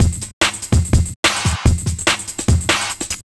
145, Breaks; neuro; Sinistarr

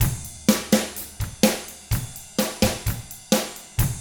127 SURF ROCK

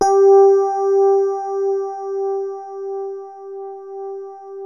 DELICATE, DX RHODES; Best Service Advanced Media Trax Modern Composer

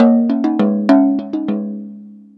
Jug Hi Melody; Ambient; Melodic; Ambient-Melodics

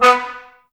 BRS TBSB, Brass, Tbs Mute; Miroslav Vitous - Woodwind Brass Ensemble

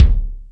Lotsa Kicks, Drum Machines

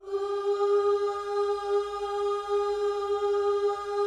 OOS G#4, GOSPEL; Spectrasonics; Vocal Planet

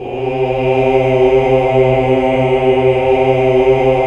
OOH, OOOH Uni; Sound & Vision - Gigapack II CD 1 (Roland)

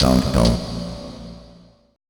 FX VOCAL

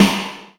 Spitter Snare, Esoterik Beatz; Spitter Kit

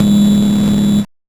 Noise, 8-bit, Bitty Noises; musicradar; 8-bit bonanza

